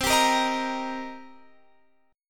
C Minor 7th C; Cm7 strummed; x,15,17,15,16,18

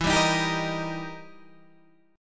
E Minor Major 7th Flat 5th E down Guitar Standard; EmM7b5; strummed; JGuitar

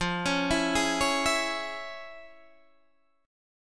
F Minor Major 7th Sharp 5th Guitar Open D

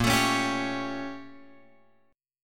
A sharp Minor Major 13th, A sharp; {6 X 7 6 8 9} strummed

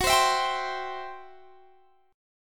C Major, F sharp, chord

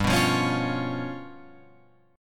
G 7th Flat 5th, Guitar, Open F; strummed; JGuitar

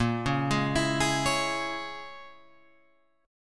Bb Minor 7th Flat 5th, Guitar, Open F; Bbm7b5 arpeggiated

